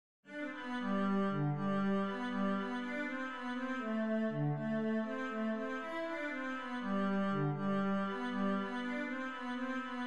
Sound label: irish washerwoman cello